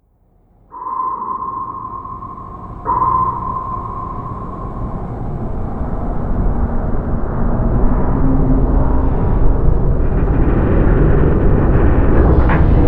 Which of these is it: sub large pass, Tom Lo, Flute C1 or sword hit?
sub large pass